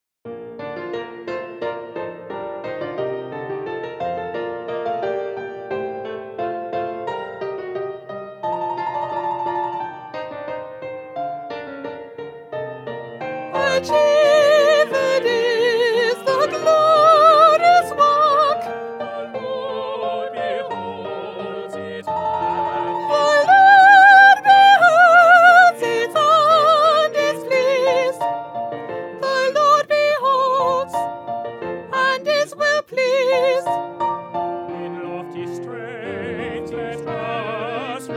Soprano; Haydn

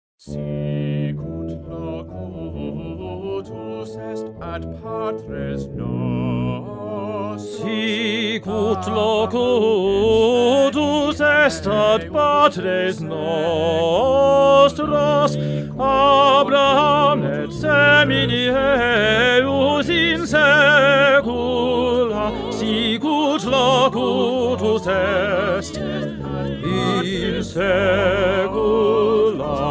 Tenor; Bach; Magnificat

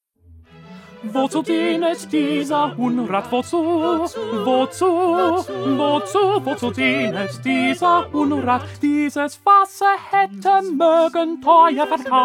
Tenor; Bach; St Matthew Passion

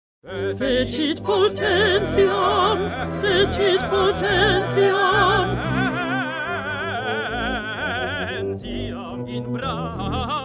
Alto; Bach; Magnificat

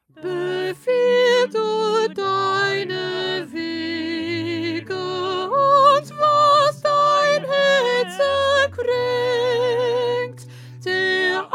Soprano

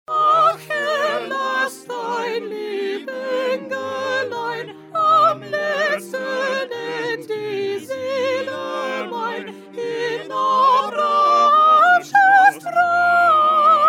Soprano; Bach; St John Passion

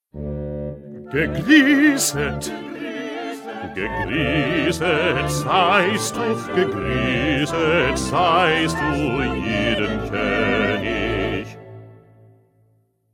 Bass; Bach; St Matthew Passion

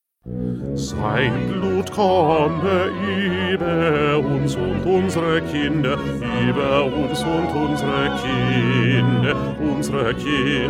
Bass; Bach; St Matthew Passion

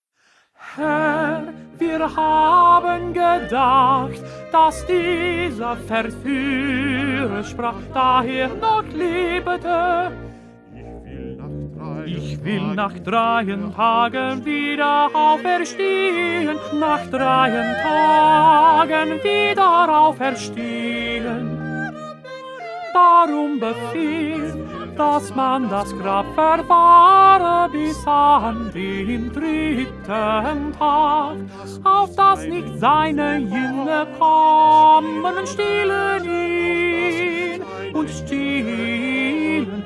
1st Tenor

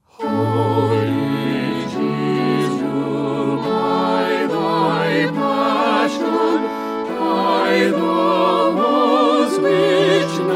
Concert Version

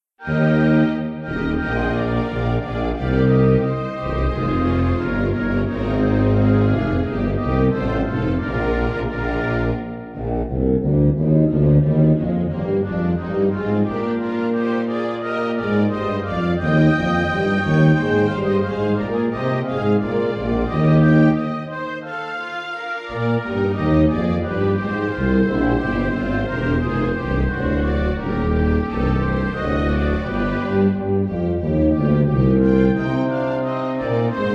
Instrumental

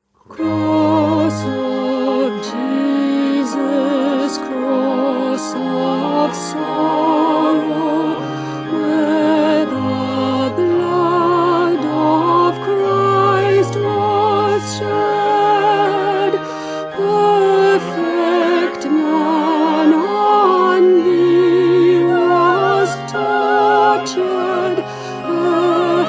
Alto; Stainer; Crucifixion

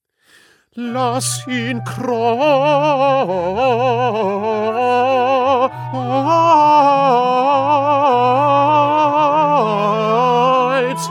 Bass; Bach; St Matthew Passion

